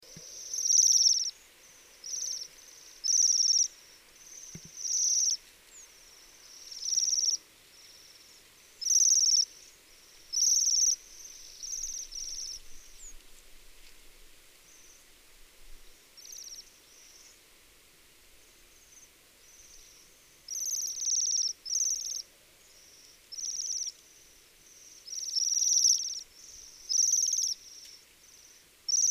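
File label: waxwing voice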